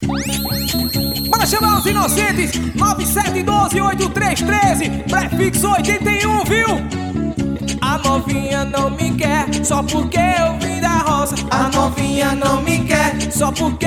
pagode

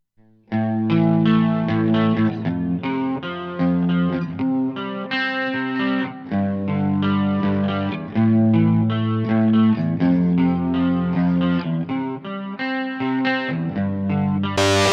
arrependimento instrumental

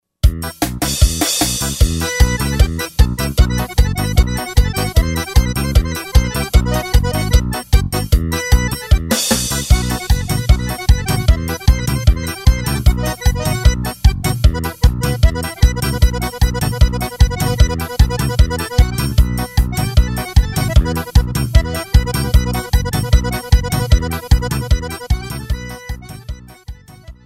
arrastape, ritmos roland g70

